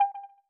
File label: nice ping